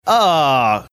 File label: aaghh